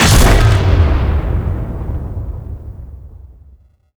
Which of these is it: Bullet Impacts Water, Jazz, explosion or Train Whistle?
explosion